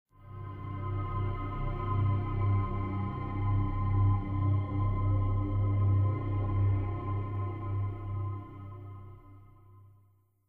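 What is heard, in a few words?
melody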